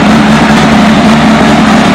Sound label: idling